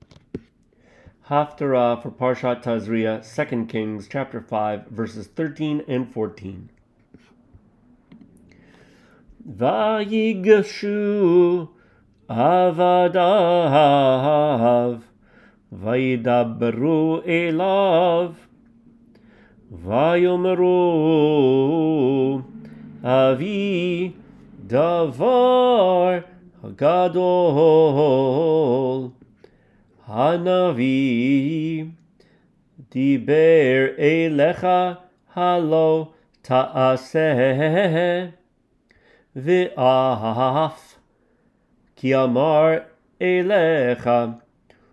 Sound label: Parshat Tazriah Haftarah